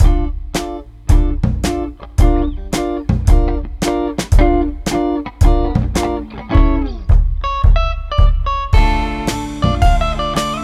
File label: pink noise waltz